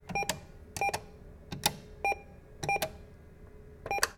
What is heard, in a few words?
Atm Buttons